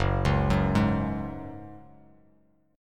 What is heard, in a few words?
Gb Suspended 2nd, chord; Gbsus2 arpeggiated; 2,4,4,1